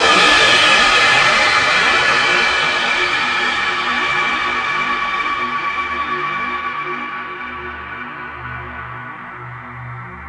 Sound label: cym